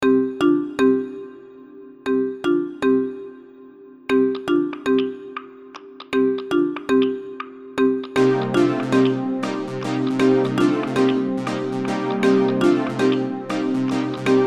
Wheelhammer; Noisebiscuits

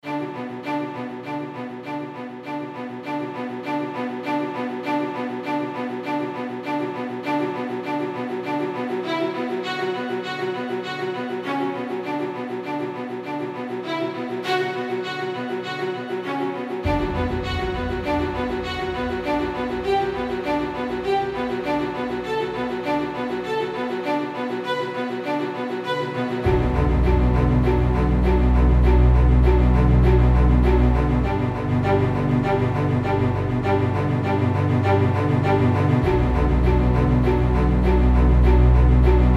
Castle Fortress; Noisebiscuits

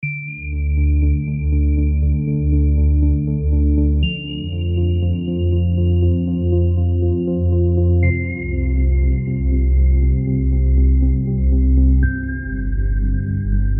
Analogue Nights; Noisebiscuits

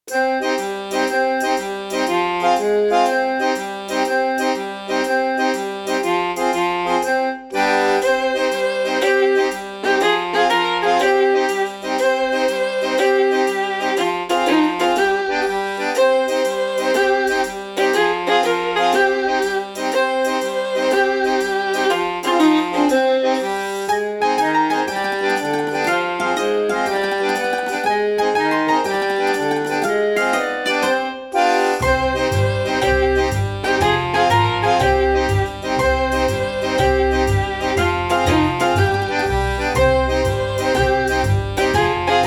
Tarantella Salento; Noisebiscuits

